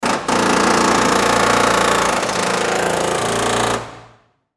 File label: Pneumatic Wedge; Machinery; INDUSTRY